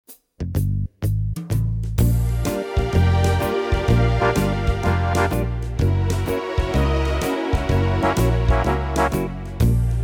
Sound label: Tyros Style Pro Orchestra Swing